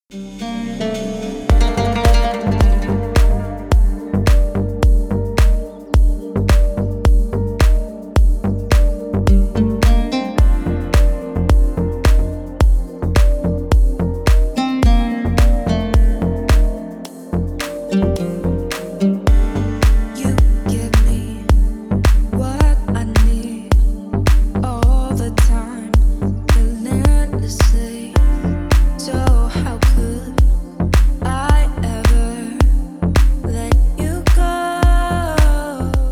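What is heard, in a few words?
beautiful calm deep for ringtone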